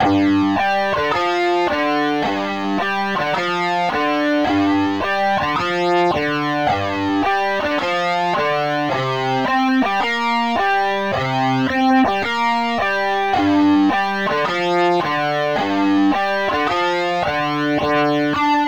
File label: Lazy Guitar